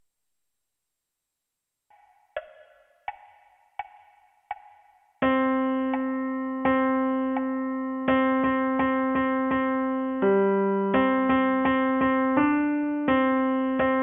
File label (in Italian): piano unite